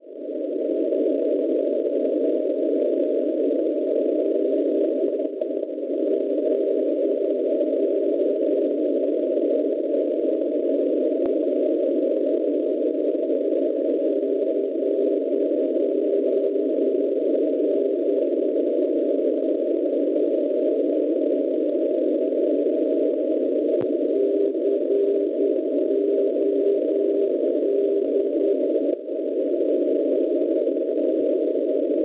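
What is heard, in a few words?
non-directional beacon 400.5 kHz; COD; Codogno; Italia; Schwarzsee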